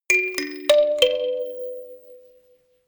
notification kalimba rattle sequence minor

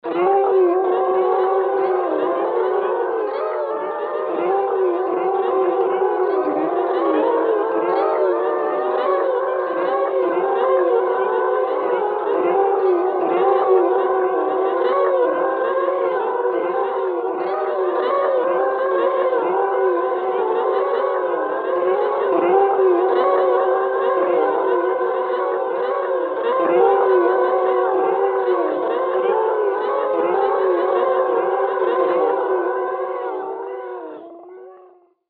creature loop

